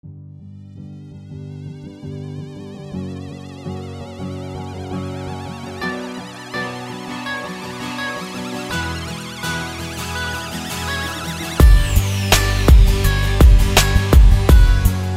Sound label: Beat